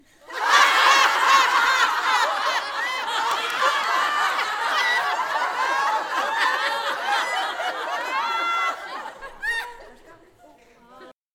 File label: Female Crowd Laughing